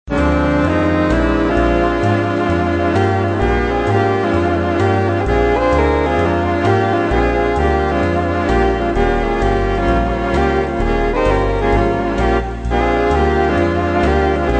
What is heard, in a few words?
reaktor big band, wind sounds